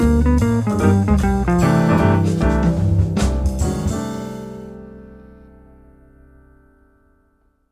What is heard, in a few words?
jazz ending